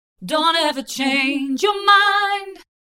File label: Phaser Psychedelic Wavy Vocals Wet; Chromacycle; Syntheway